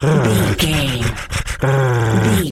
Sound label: wolf growl